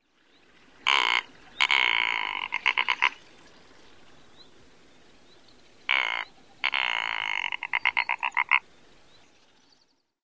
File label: rattling song